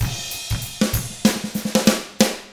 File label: 95 FUNK F10 L, drums; ILIO; Double Platinum Drums 1